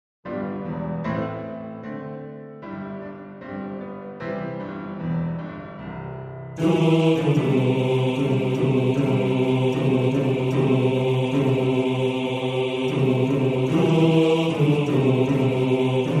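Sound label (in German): Bass 2, Männerchor; Einsames Glöcklein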